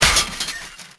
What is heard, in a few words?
zombie bang fence